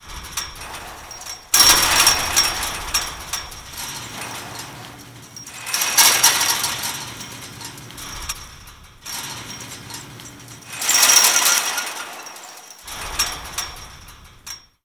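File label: metal chainlink fence big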